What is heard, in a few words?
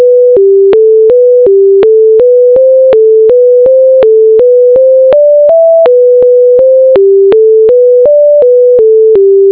tone; victors